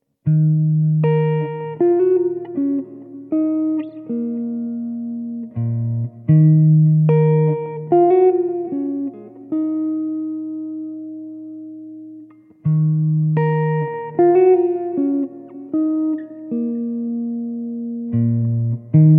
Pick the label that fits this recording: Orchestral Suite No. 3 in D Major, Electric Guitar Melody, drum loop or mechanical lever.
Electric Guitar Melody